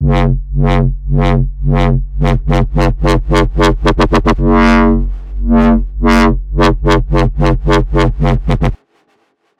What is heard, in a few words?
Wobble Suck, bass synth; Wave Alchemy - Bassynth 1.1.0